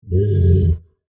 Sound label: Monster Groan, Horror, Monster, Groan, Creature; Deep; Monsters (Sound Effects:Horror)